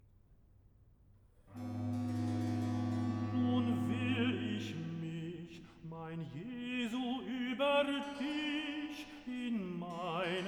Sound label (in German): Recitative Nun will ich mich, mein Je; Sehet, wir gehn hinauf gen Jerusalem, BWV 159; Nun will ich mich, mein Jesu (Tenor)